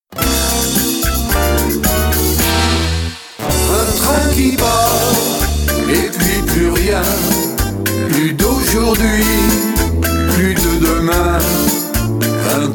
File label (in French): version chantee accordeon